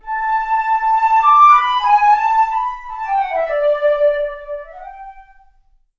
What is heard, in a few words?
Flute